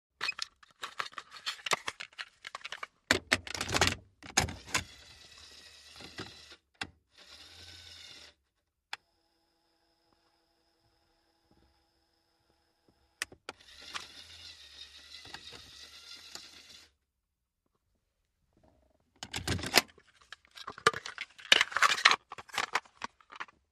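Mitsubishi Montero interior: Cassette player, cassette from box, insert, play, eject, put back in box; VEHICLES MITSUBISHI MONTERO: INT: Cassette player, cassette from box, insert, play, eject, put back in box.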